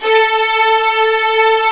STR SW VLN, Vlns 8 fff, Agitato; Roland L-CD702

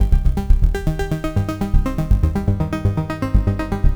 NRG Arp, trance

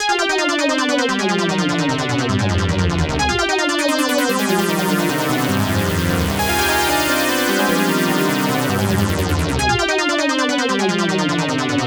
FILTERFUNKAR, ARPEGGIO 150; Masterbits - Tekkno Trax

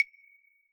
claves, Yamaha DJX Rock Kit

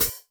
Hihat, Yamaha PTX8

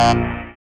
SYN TAKE, Dance Synth; Sound & Vision - Gigapack I CD 2

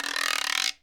GUIRO; Sonic Reality; Interactive Drum Kits

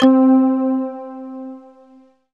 D50 KX11 C4, DIGISYNTH; Best Service; Alpine Volksmusik

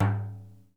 deff tap; Deff Bendir &Req

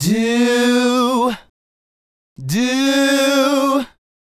Male Group, Hip-Hop and RnB Vocals

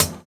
Closed Hat, JUNGLE BREAKS; ORIGINAL JUNGLE BREAKS; JBK DRUM SOUNDS; JBK HATS

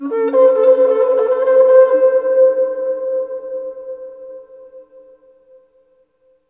HRNPHR, Heart of Africa; AMB. HRN FX

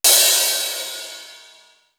BRONZE, CYMB HH; East West; Global Sounds

